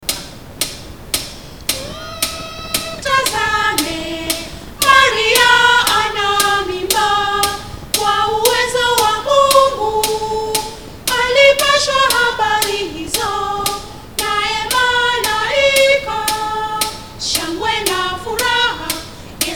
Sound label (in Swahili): Soprano; harmony